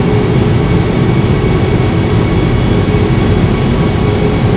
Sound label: cfm13b; IDG-A32X; FL2070-NEO